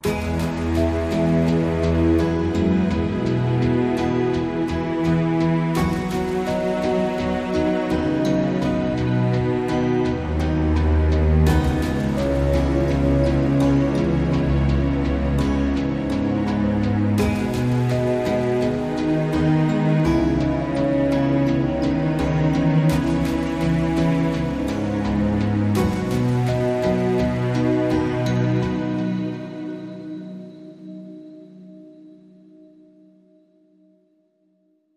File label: Underscore